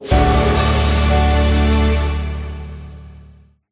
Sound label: win bigwin end; hades River Of Souls; Fantasma